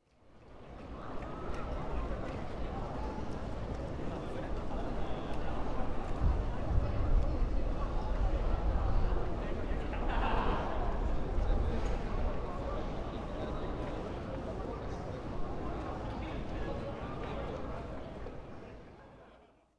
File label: adults chatter; anemoi